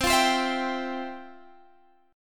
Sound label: F Major 7th Suspended 2nd, chord